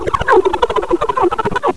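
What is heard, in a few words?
alien quick, weird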